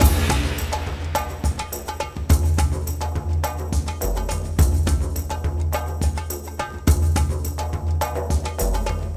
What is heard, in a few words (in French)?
Rythme; Odyssey The Search for Ulysses